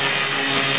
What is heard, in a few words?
beam; battalion